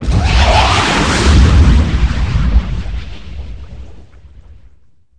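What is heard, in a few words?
combat explode tie defender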